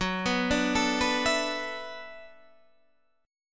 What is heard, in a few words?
D 6th Add 9th Gb